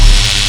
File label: bolt loop, spells; wizardwars